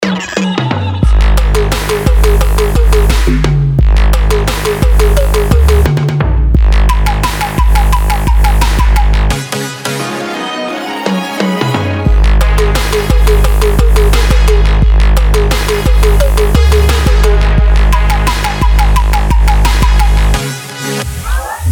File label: heavy bass